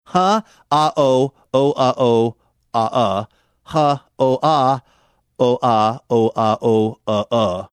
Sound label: huh ha ho ho ha oh